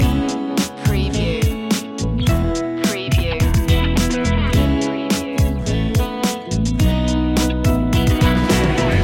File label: bouncy beat loop